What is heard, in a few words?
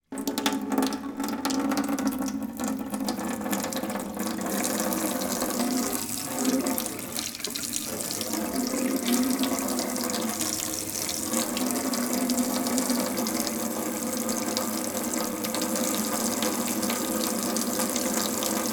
household water from tap into metal sink faster water at end